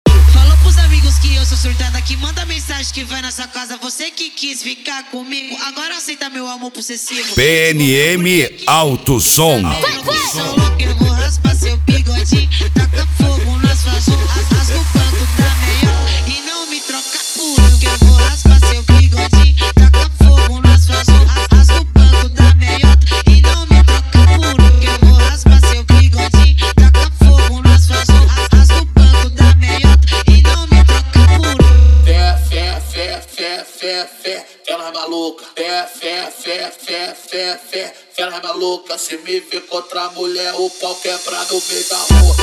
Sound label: funk